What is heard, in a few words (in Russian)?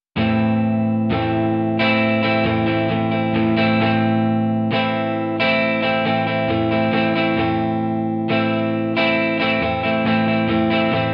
Rasshirennyj boj vosmerka bez glusheniya